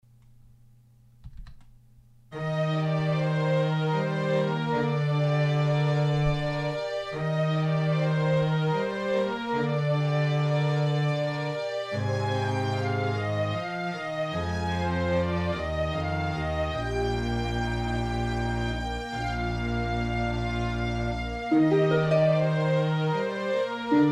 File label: Fantasie instrumental